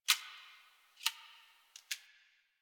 Computer Room Floppy Disc

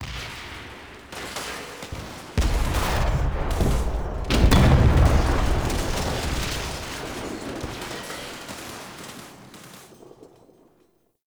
Ambient Battle Close; Warframe; Tau

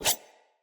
dagger whoosh heavy; Sinecure Dagger

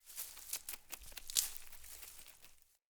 Hare Idle Chatter; Encounters; Animal Rescue

